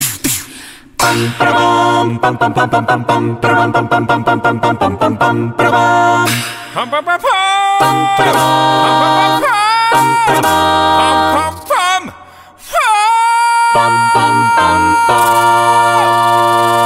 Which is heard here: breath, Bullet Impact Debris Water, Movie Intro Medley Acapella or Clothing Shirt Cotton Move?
Movie Intro Medley Acapella